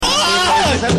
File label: aaaaeei